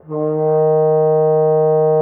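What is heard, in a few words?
French horn D#3; Xsample VOL-3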